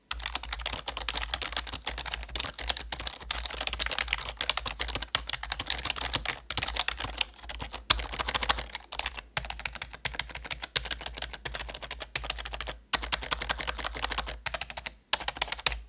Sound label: corsair k55 core soundtest